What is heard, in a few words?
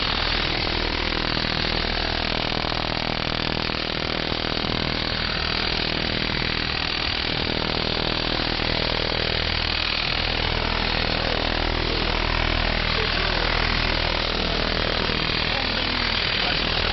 Interference 7700.00 am